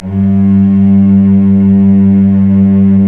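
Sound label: strings, mf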